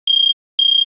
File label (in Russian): Быстрый сверчок; 1сек; Циклический повтор; nvartovsk